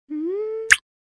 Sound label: Female vocalization with kiss sound